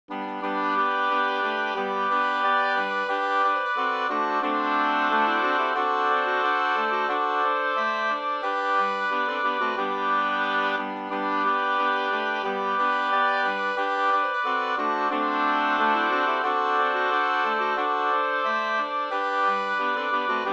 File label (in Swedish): ganglat Gdur; Gloggmarsch